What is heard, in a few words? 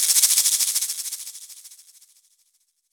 Egg All End Off, shakers